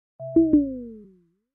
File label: critical lowbattery; oneplus